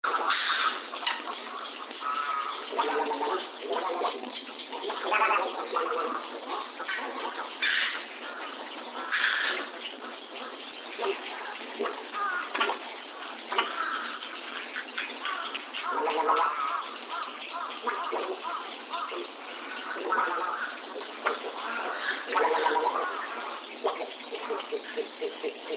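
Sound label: Little Egret; koe; kuki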